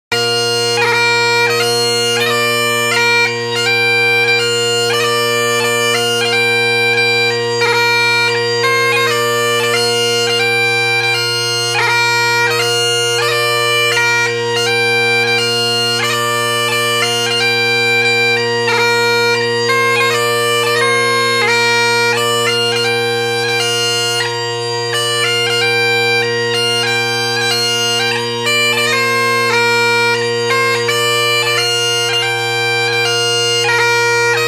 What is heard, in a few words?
Highland Pipes